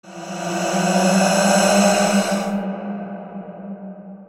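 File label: scary breathing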